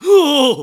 Startled; Sahasrahla startled; zelda

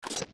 steps land; Jabu Jabu; zelda; OOT

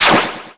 Chain Retract; Sheik retracting the Chain; Zelda

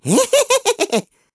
Eheheh; Rupin: "Eheheh!"; Skyward Sword; zelda

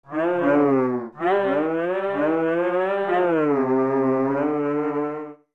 Sing; The Four Giants singing miscellaneous notes

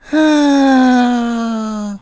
Sigh; Postman sighing (heard when greeting Link)

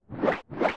Boomerang Fly Start; Zelda; OOT

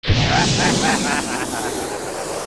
Flare Dancer Laugh

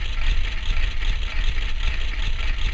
Fall Loop; Ganons Tower; Zelda; OOT

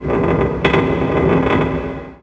Ship Creak

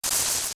Grass Cut; Cutting long grass and bushes (also opening castle curtains); Zelda

